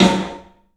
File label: PA DEEP L, CLUB SN VRB; 3D Sound; 3D Drums